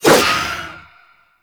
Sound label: Void Dagger Heavy Whoosh; Warframe; Zariman Dagger; Void Mode